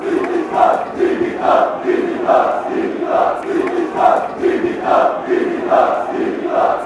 song, fan chants; fc thun

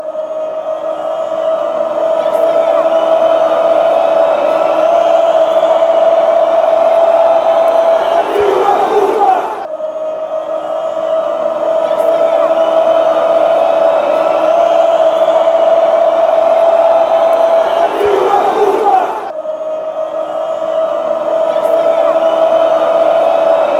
chants

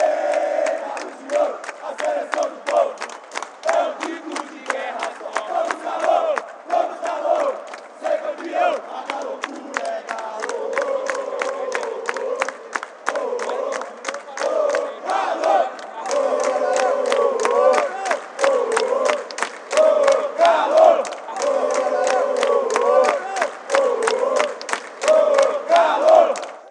fan chants; galo de ouro

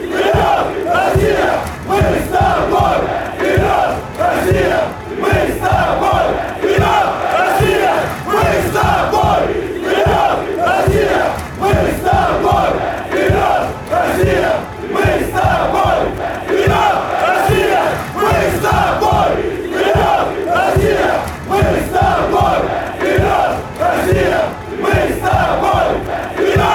russian song, fanchants

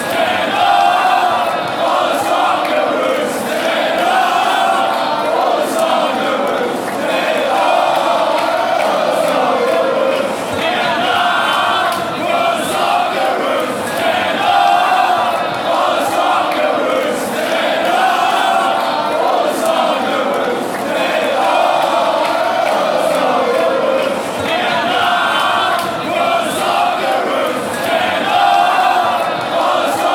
chants; socceroos spain

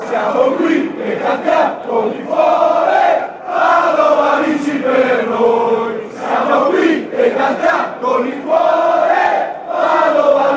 heart fanchants

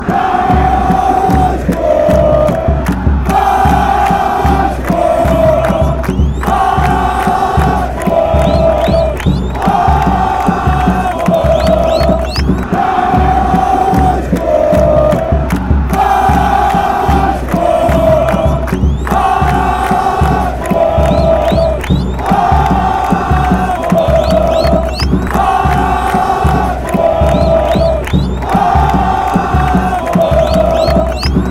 vasco vasco drum

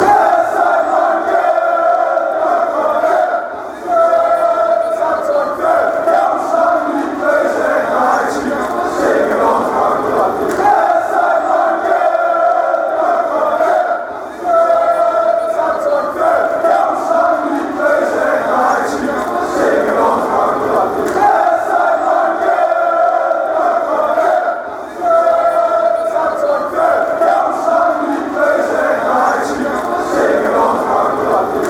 ajax chant

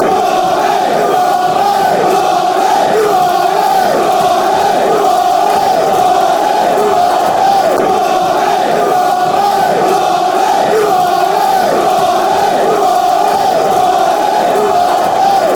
blue army blue army, fan chants

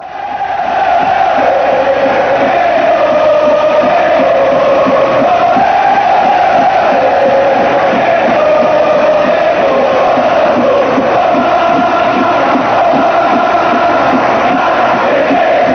ole, chants

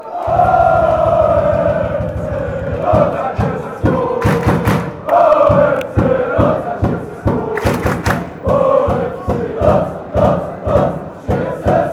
oh score goal, chants; fc luzern

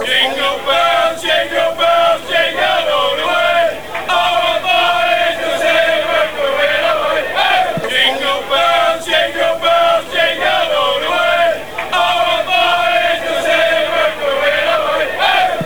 jingle bells

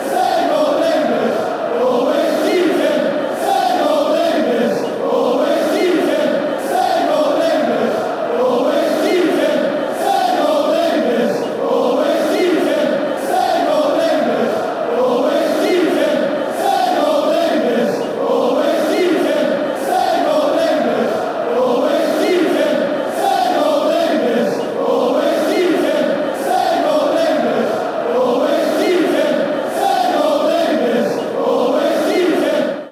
same old english, chants; wales

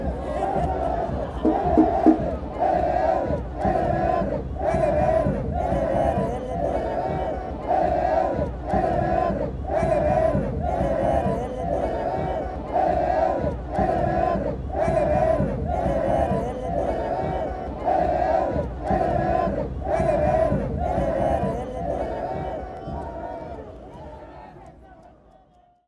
lelelelelele

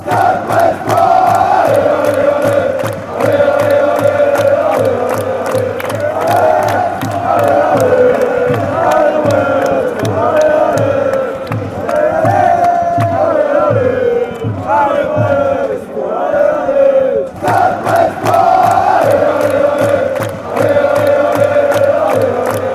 chants, football songs; stade brestois

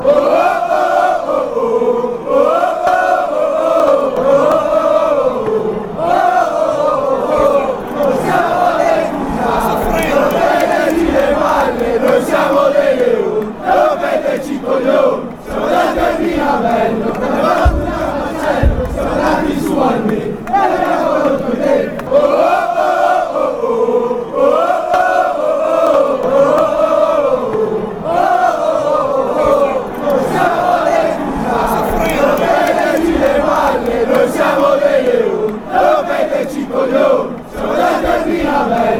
ohoh, fanchants

